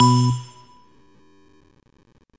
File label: Train